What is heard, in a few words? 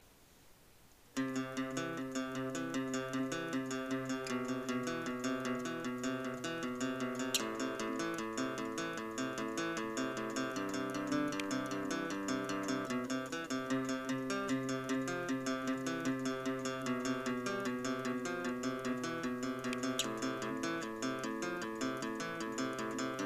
Guitar Solo